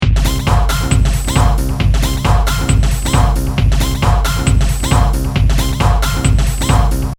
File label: Music loop